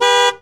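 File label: Truck Cherokee Horn; SFX Kit; Trucks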